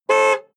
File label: sports car ext horn single different lengths